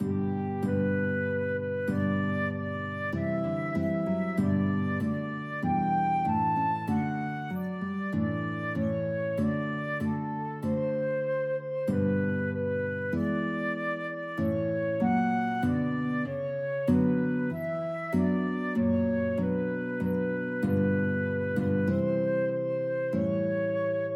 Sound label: navy hymn guitar flute duet